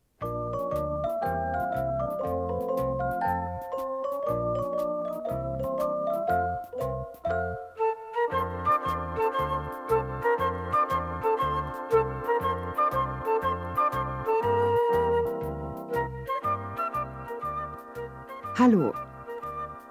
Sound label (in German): Spiel Blockfloete Einleitung